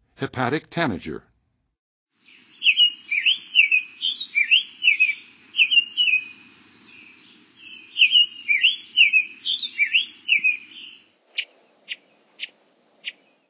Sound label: Hepatic Tanager, Songs of Birds of North America; Western USA; Western USA Songbirds